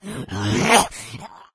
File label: rage at victim, infected female